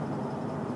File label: metallic like pinging noise truck noise; 2nd gen ram